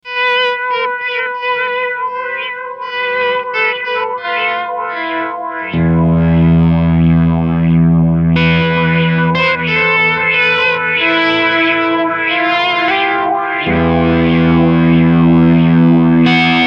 Preset Filter

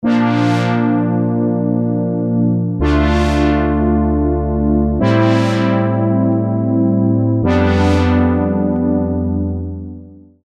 SX240 DUALMODE PURE

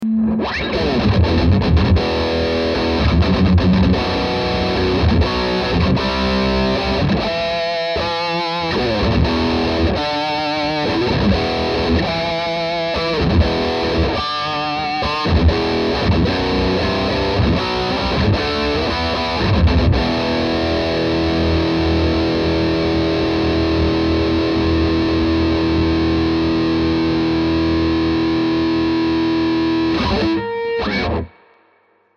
Modern Gain Les Paul

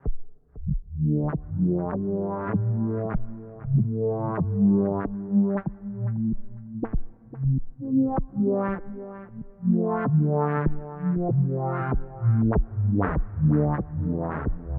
Boss SY 1000

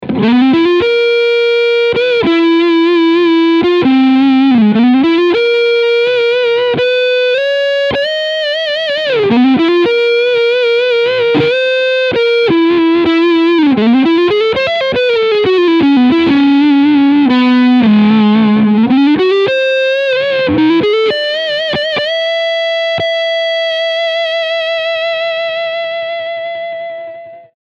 Leadsound Front PU

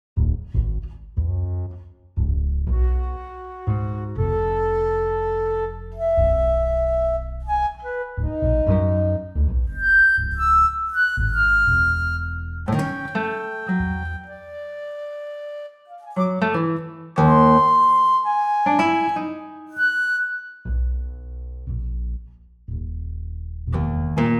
Trio

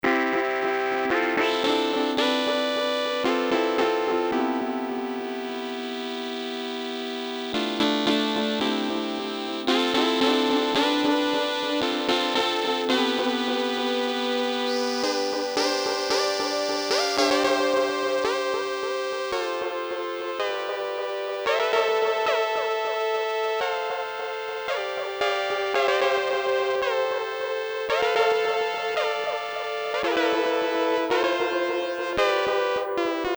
Korg Volca Keys

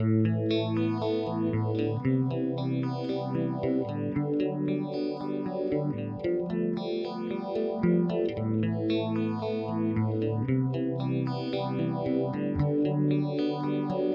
Zelzah 4 Stage Classic; Strymon

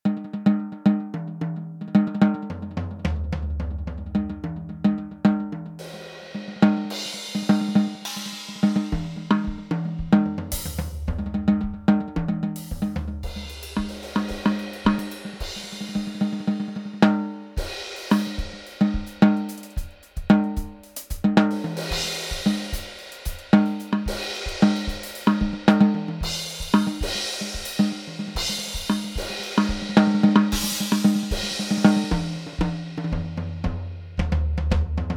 EFNOTE PRO 703 widen

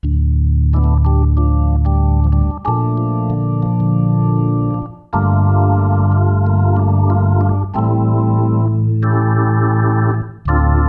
Hammond SK1 The Rock

